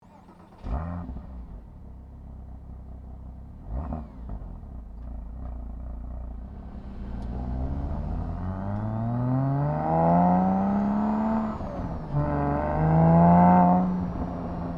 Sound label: Porsche Cayman S